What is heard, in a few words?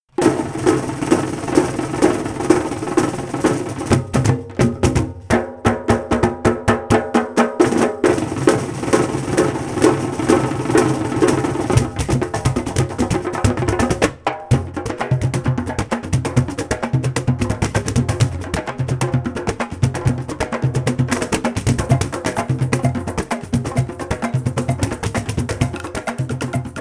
Drum, bellydance music